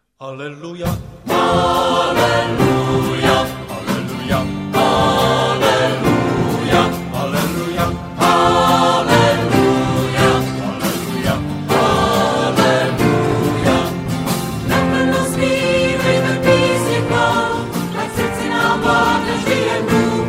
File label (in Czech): Aleluja